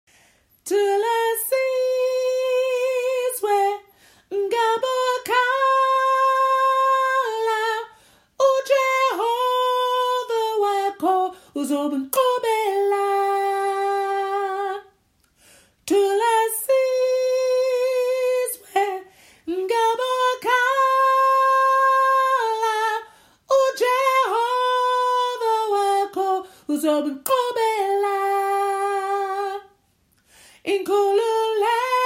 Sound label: Soprano, gospel choir; Thula Sizwe; Practice Songs